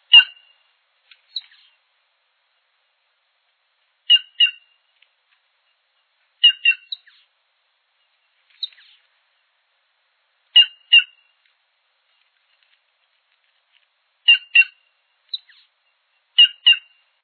canca

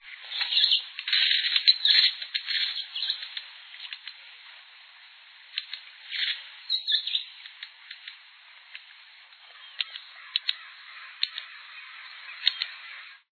martim-pescador-pequeno

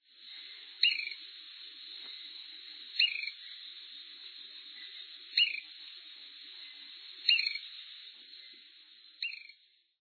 beija flor rubi

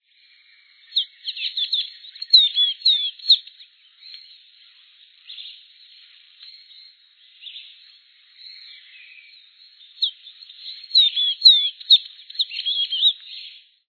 sanhaco-da-Amazonia

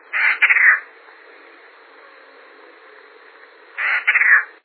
chincoa

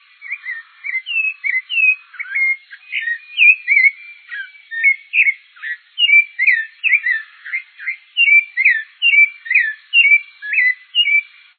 sabia-laranjeira

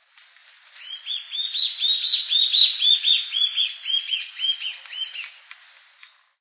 guarda floresta

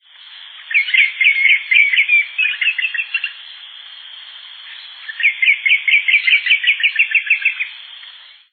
chororo-escuro

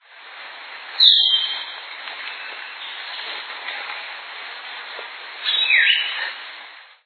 saura

